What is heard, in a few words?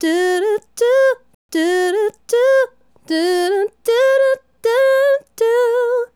Female Solo, Hip-Hop and RnB Vocals